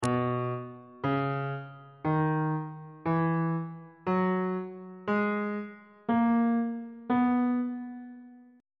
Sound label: c flat major note positions on bass clef